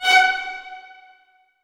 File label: F#4SPC, HALL STRINGS; AKAI S-Series CD-ROM Sound Library VOL-7